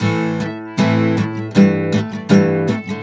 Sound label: AM GTR, Celtic Flavours; USB Soundscan vol.42; AKAI